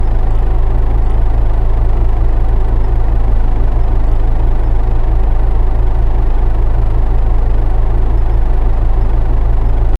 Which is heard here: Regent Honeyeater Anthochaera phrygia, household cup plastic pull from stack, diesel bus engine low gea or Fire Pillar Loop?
diesel bus engine low gea